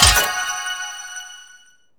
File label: SE MAP KEY OPEN; maimai